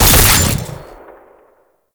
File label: Fulmin Prime Alt Shot; Weapons